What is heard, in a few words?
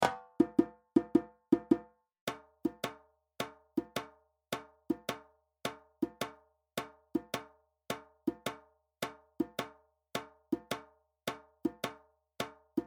Djembe